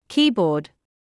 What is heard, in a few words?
keyboard